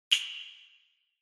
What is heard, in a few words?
Castanets